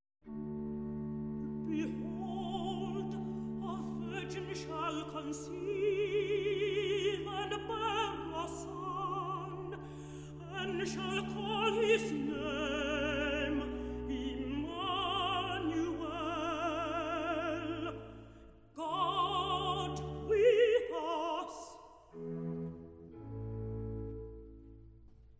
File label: Toronto Symphony Orchestra; Messiah - Handel